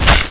worm damage

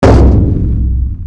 detale move

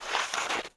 turn page

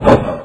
tendrilrion damage

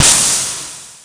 die; banaspaty

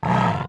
damage

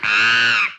dryad die

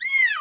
baby leopard damage

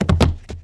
die; assulter

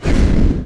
spirit flame stand

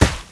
bigfoot step

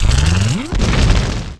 rock down

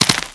galion move

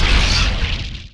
exposion blaster

